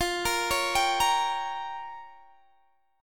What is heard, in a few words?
A sharp Minor 6th